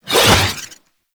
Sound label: Sword Whoosh; Warframe; Sentient; Stalker Sentient Sword